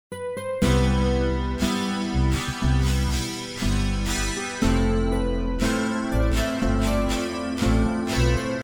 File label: guitar